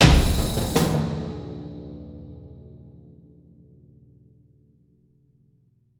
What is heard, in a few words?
Mission Started; Cross of the Dutchman